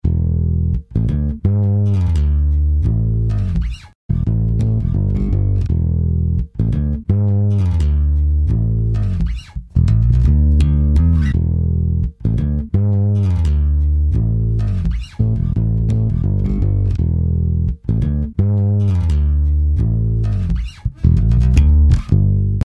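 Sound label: sounds with effect of completion, ending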